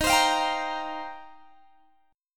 D sharp 6th Add 9th, D sharp, down, Guitar, Standard; strummed; JGuitar